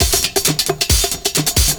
PERCS, 70 s Breakbeats

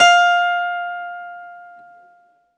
ZITHER, Alpine Volksmusik

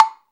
AGOGO, Brazil; AKAI S6000 CD-ROM - Volume 5

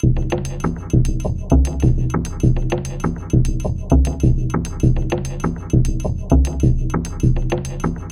120 MAAR LP 1, Industrial Loops

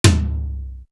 Tom, Drum One Shots; Coldcut's Kleptomania VOL1

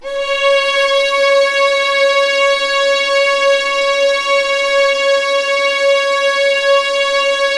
C#5, String Collection; East West; The Ultimate String Collection

